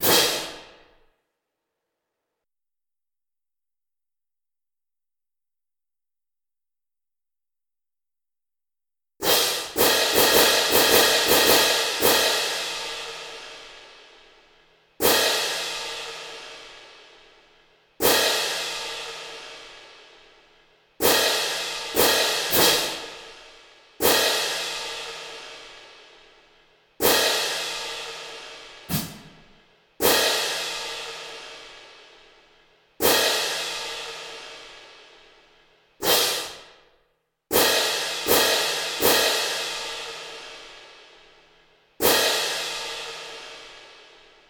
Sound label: cymbals, drumline